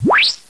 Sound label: zap